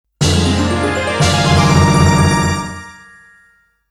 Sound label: Heart Container Acquired